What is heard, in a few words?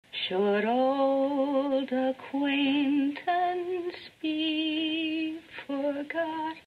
singing